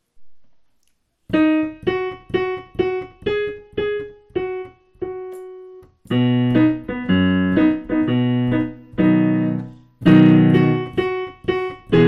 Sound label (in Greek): vrexei vrexei piano